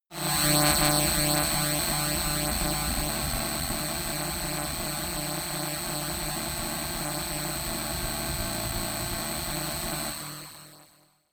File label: noise scanner